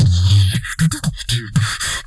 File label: Techno Beatbox